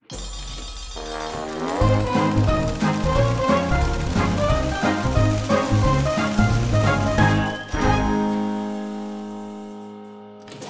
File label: big Win; cashomatic